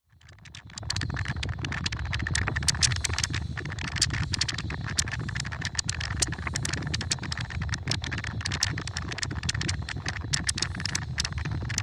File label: Bug Melter, Machine, Molten Lava Bubbling, Electric Arc